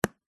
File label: industrial toolbox heavy duty plastic metal latch click lock